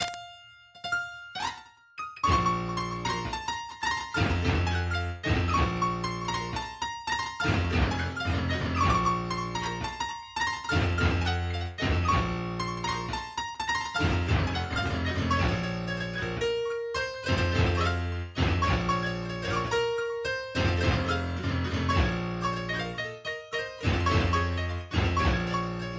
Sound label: Shut Down Violin